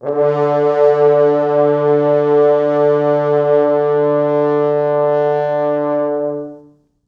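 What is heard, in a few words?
4 FrHorns SUS, French Horns; East West; Quantum Leap Horns Sax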